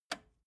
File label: record player repeat jump out release